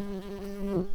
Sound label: bumblebees, Hypnorum workers